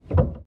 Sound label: piano release; samples-piano